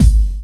Kick, drum hits; Hip Kick; Loopmasters; Jon Carter & Alex Blanco; Block Party Essentials

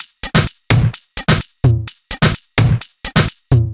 Dirty Dutch Loop; Mutekki Media; Vengeance Electroshock Vol.2

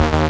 Synth Shot D#; Mutekki Media; Vengeance Electroshock Vol.2

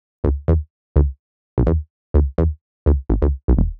126 D E BASS, Funky; Loopmasters; Funky Underground Vol 2; BASS LOOPS

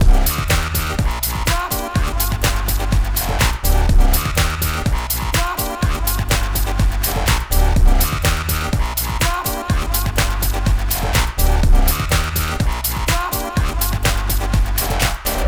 124 G Full Mix Drum, Complex Electro Synths; Elements; Prime Loops

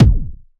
Kick

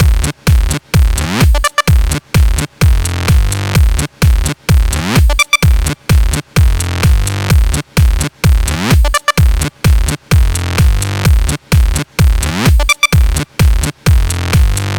Electro House Mix; Mutekki Media; Vengeance Electroshock Vol.2; Electro House Kit 3

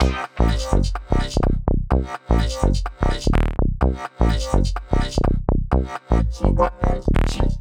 126 C COMBI GROOVE, Funky Underground; Loopmasters; Funky Underground Vol 2; COMBI GROOVES

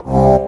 C STAB; funky; Loopmasters; Funky Underground Vol 2

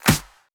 Claps & Snares

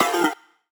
F STAB, funky

